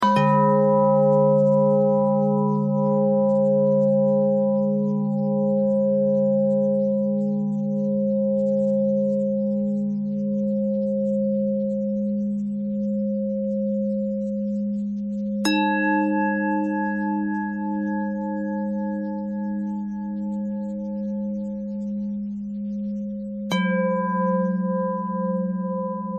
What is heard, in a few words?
bell thread pentatonic